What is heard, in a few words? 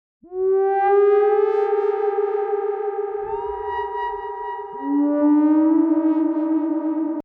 Lead